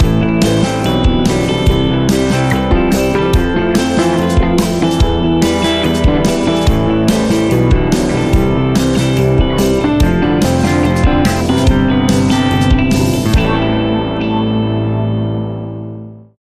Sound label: Indie Fuzz Rock